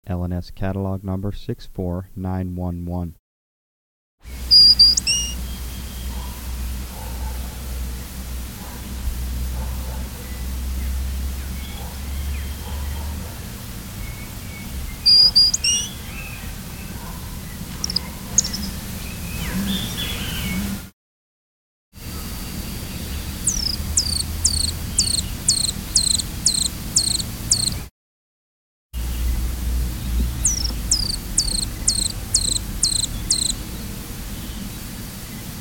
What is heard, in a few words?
copper rumped hummingbird